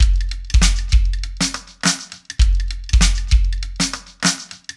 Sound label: CLICK LP; CONSTRUCT 3